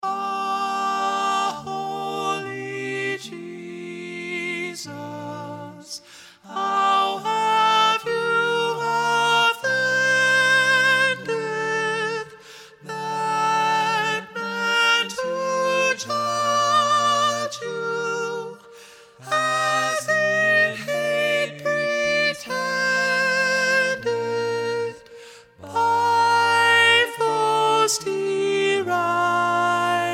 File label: Soprano Prominent - Ah Holy, Vocal